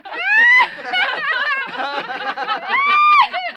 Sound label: Laughter Mixed Group